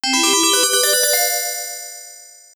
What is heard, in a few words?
1-Up